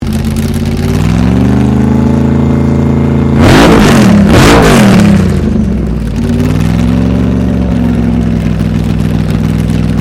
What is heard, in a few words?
ENGINE CHEVY C5R 7LTR REVING CLOSE, Army recruiting vehicle; Trucks